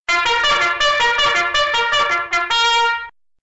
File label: sound bugle